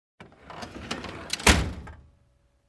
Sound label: Closing compartment